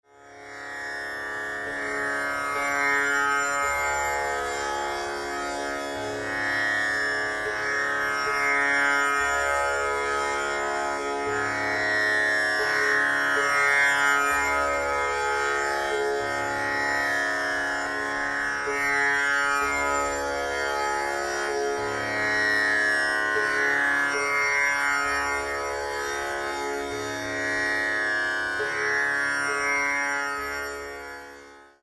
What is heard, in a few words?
Tambura large c sharp plus2